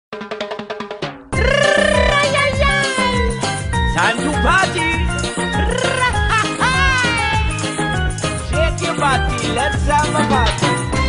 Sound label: Calypso